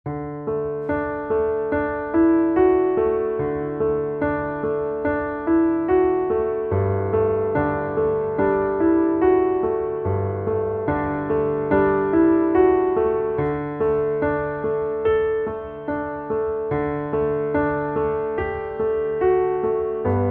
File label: Underscore PIANO; Worship Flow